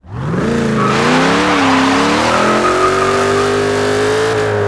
first, vehicles

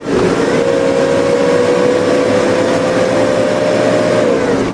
second, vehicles

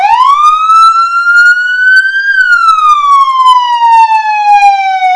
nypd siren; gmod; lwsirens

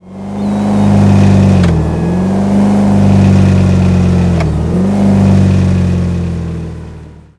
throttle off, vehicles; gmod; tdmcars; gtav; gauntlet

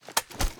ar drum reload fast end; weapons; akilo47; viper

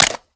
reload fast magout, weapons; xmag; gmod; viper

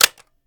ar reload dmr mag in; weapons; gmod; viper; scharlie

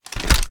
inspect

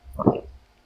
drink; gmod

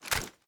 reload empty end

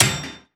frag physics bounce metalgrate hard; gmod; viper

